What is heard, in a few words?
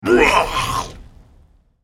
spell cast effort; ZombieBrand